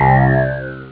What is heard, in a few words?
Alien